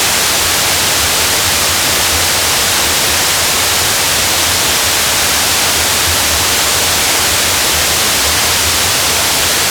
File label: Waterfall Loop